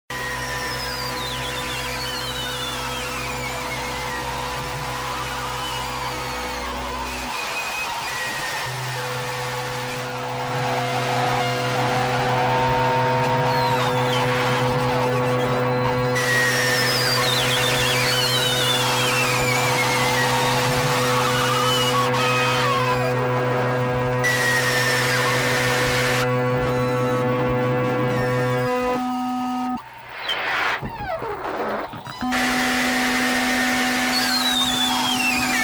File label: open noise; kunstvlaai 2006